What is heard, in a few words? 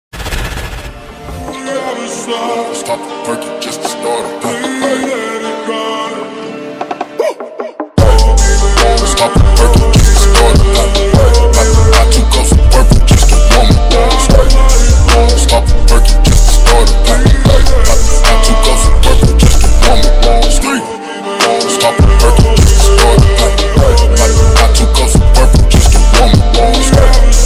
Remix Bgm Ringtone